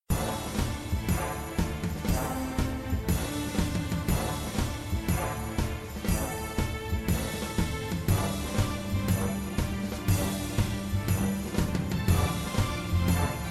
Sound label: Free Spin BGM; slots; Pirate Quest